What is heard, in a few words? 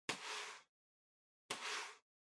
PC Mouse Move Far Hard Pad Hit